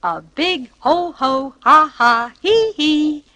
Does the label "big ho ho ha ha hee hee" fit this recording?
Yes